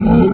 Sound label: lizard; Undercroft; enemy